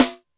Snr Hi Class, Alesis D4 drum machine

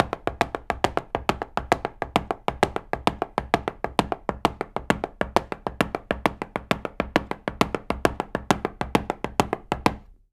133 STEPS, Spanish And Gypsy; Soundscan vol.59; Spanish And Gypsy Traditions; AKAI

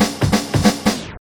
Fills, Loops 140 BPM

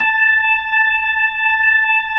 B-3, Jazz

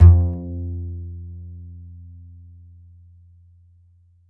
A, Upright Acoustic Bass; Larry Seyer; SWITCHABLE POSITIONS

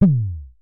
Synare Filter; Star Instruments Synare 3

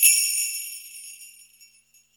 SLAY BELLS; E-MU Formula 4000 Series Vol. 1; Hip Hop Nation

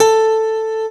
DIDI, SYNTHESIZER, DIGI WAVE

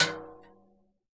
CASCARA, Synclavier Percussion; ILIO; Synclavier Percussion 2; World & Orchestral

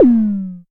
tom, 5U Modular Drums; Polyend; Simulacrum Sounds; 1 Shots; Synths

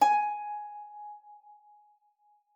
G5, HARP; Xsample VOL-8; HARP P+MF

